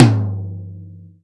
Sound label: long tail reverb tom sound g key; tuned drums g key